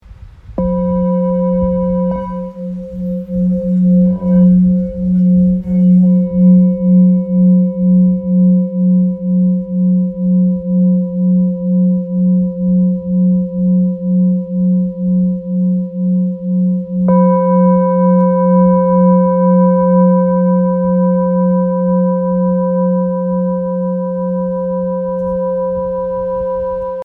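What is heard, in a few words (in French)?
bol full moon fa, bols chantants tibétains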